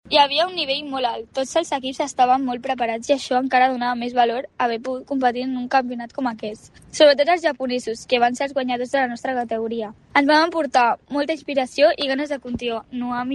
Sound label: hip hop; mundial de hip hop